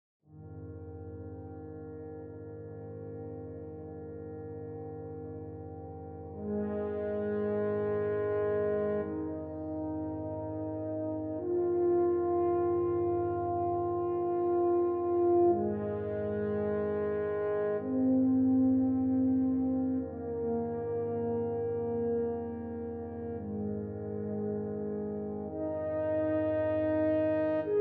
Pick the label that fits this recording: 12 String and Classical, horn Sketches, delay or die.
horn Sketches